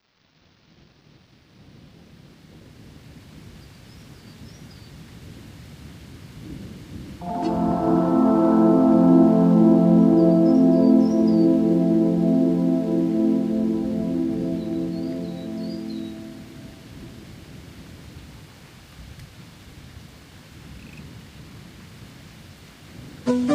Secret Forest; Soundscapes for Hypnos

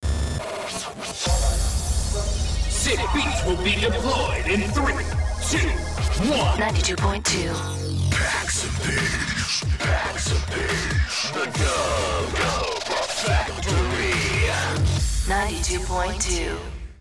Dub Factory 92.2 (Jingle 1)

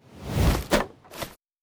Woode Sword; LooterKings

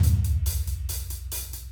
Boss Transition; Okunoka

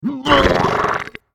Zombie Attack; Character Zombie Attack2

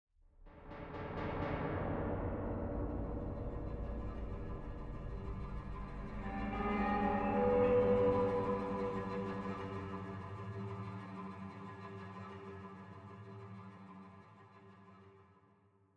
Spooky Ship Mood

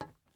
glass step; teardown; ps5 windows xbox series xs

